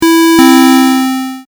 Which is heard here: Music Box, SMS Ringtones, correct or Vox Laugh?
correct